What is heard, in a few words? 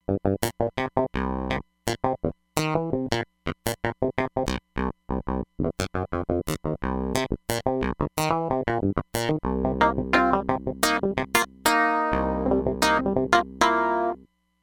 Analog Clavi, Roland Fantom XR